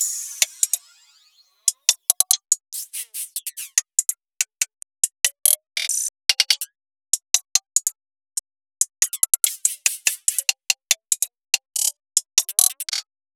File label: 143 hihat loop; potent; UMRU